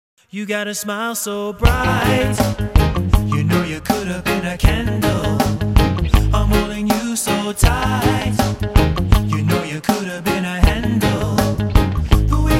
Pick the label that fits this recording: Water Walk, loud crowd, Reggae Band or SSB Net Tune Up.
Reggae Band